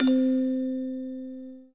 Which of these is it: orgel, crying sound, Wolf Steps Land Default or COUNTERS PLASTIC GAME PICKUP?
orgel